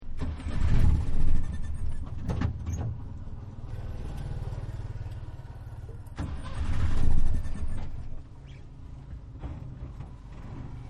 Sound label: Tuk Tuk Start Rev Drive